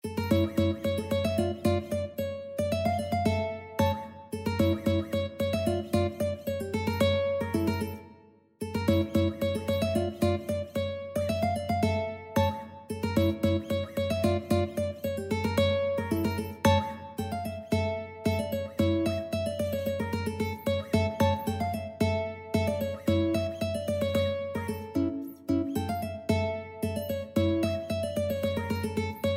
mandolin

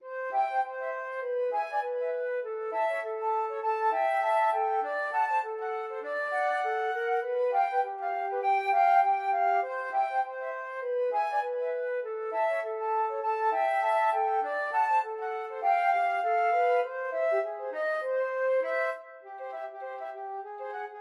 silver sand rag flute duet

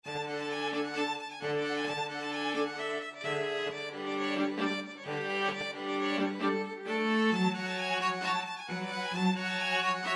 romanian folkdance, string trio

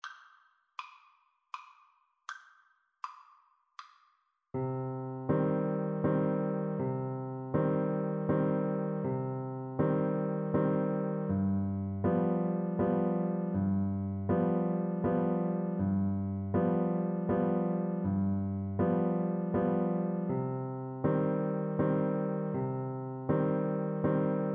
bayan ko, Trombone

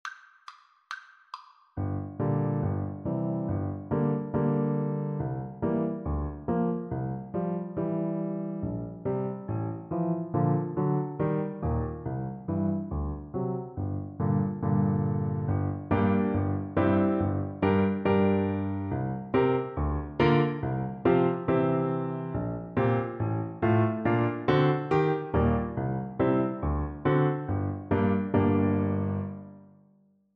romanian folk dance, Tuba

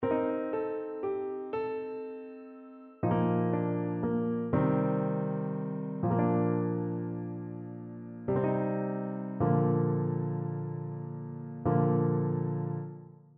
Clarinet

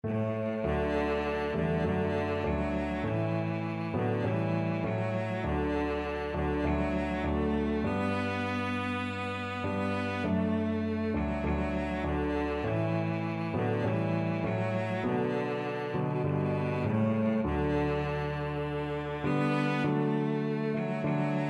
auld lang syne, cello

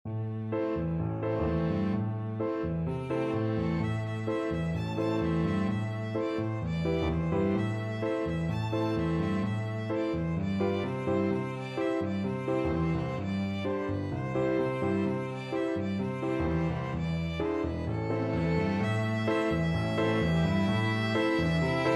piano quintet; chanukah

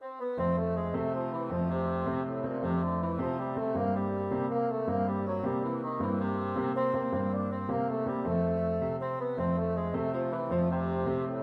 bassoon

